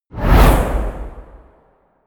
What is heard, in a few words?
whoosh